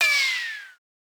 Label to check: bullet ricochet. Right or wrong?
Right